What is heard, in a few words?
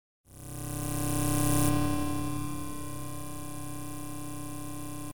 cyber Scape, cyberspace; Dystopia